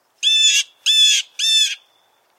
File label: Blue Jay